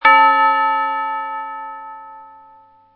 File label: multiuhr gong; elektronik